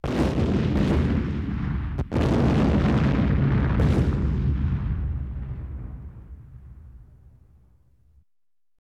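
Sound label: bomb and grenade sounds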